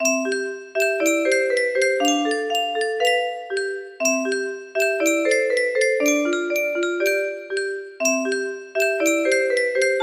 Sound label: music box melody